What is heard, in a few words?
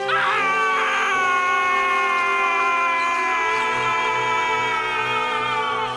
scream; handsome squidward